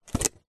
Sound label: food drink plastic fast food drink lid take off cup; zapsplat